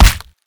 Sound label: player damage body